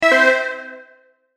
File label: correct; 正解８６（BSのクイズ番組で使われそうなピンポン。低音ver。）